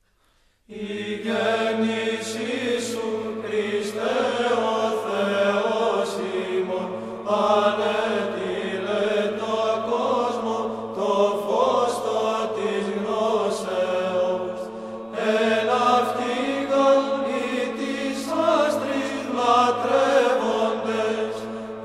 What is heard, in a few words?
Hymnes